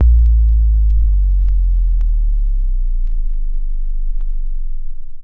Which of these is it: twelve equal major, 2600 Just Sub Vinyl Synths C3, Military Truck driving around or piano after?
2600 Just Sub Vinyl Synths C3